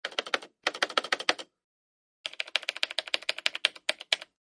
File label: PC Keyboard Taps